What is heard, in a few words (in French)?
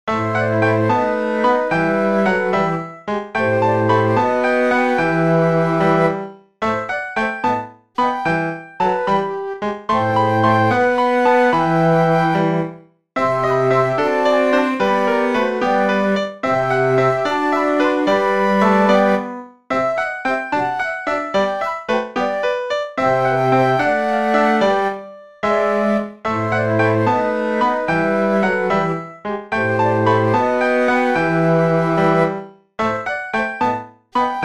pont piano